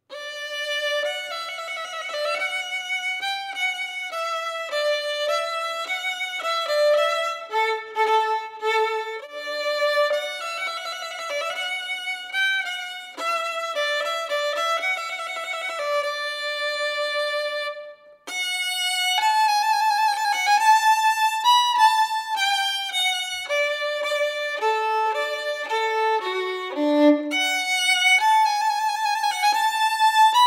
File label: Trumpet Voluntary